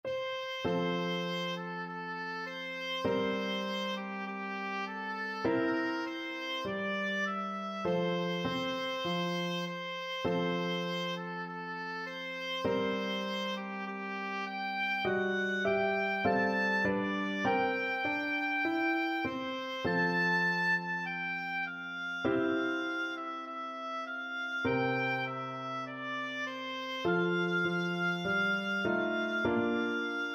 america the beautiful, oboe

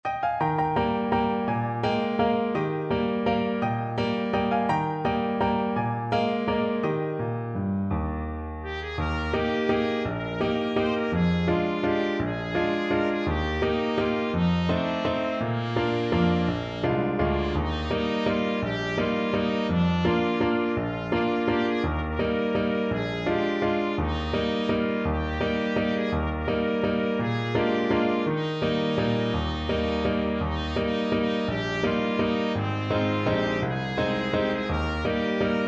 trumpet

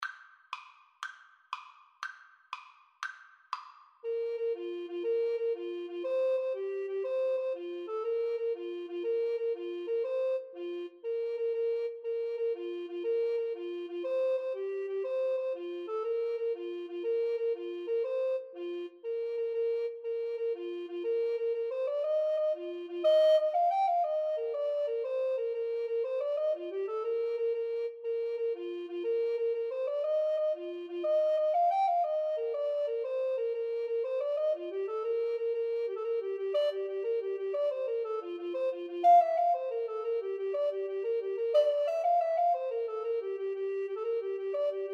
irish jig medley, Alto Recorder Duet

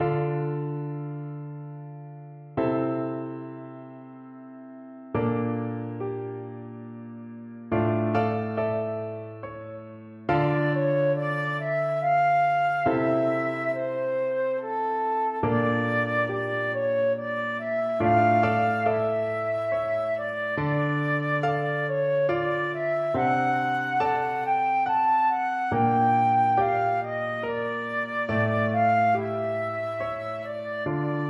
vitali chaconne, flute

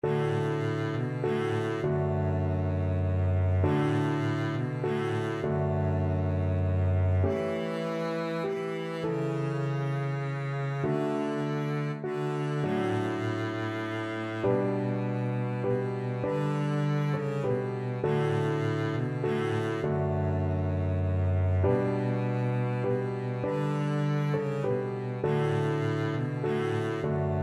silent night, Double Bass

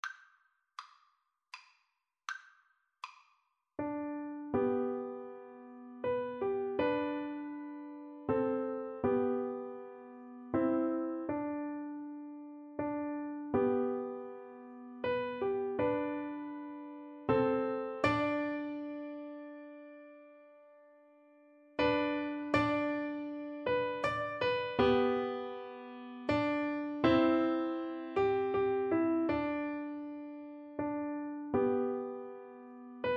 piano duet

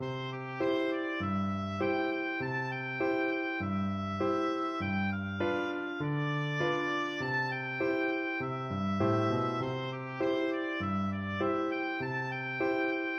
buffalo gals, oboe

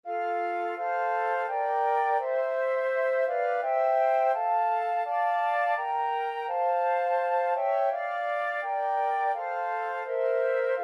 my country tis of thee, flute trio

